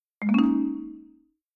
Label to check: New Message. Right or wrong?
Right